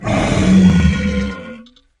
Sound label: xen bloater pain; infestation; Half Life Alyx